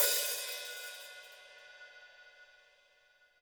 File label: HiHat & Crash, Roland TD-20; HiHats